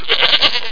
GOAT; creatures

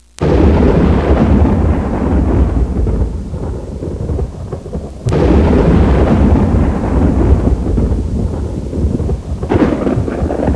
thunder

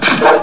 BUMP

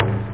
bongo; Workbench Add-On - Volume 1; NATURE

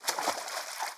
fish jump

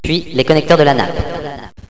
kit; Villagina; ecole

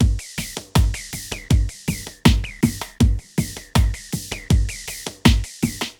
DRUMLOOP, AMBNT, 160; eJay; Ambient

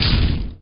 homing; axisdemo; impact

